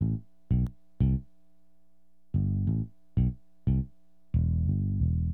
BASS, HipHop; LiveAct Pool

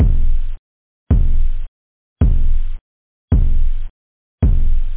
pumm, drums, technobd

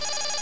alarm

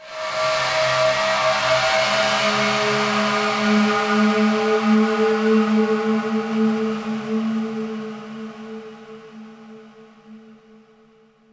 ice; DAoC; Toa; Gamestar

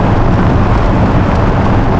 GRASS, SURFACES; ImmersionRacing

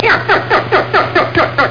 nyuk nyuk

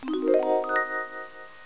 brick

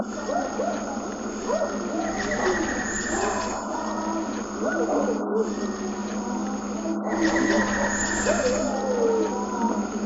howls

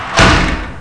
GOOD door slam

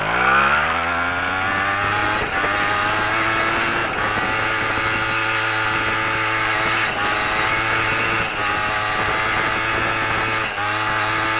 lead Bike, MotoCross

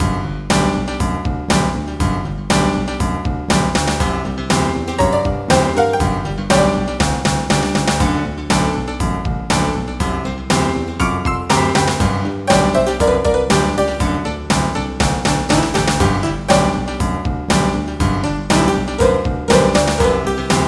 BLUESY

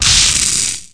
PLASMA; axisdemo; impact

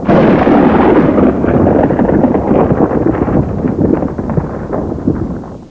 THUNDR 3B; PC Gamer IT CD 32; mtm2trial

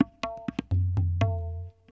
PERC LOOP ETHNO 125

